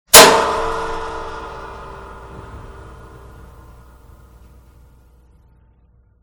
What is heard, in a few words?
Electric wall heater switch; SOUND EFFECTS1